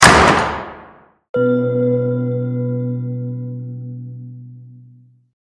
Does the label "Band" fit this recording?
No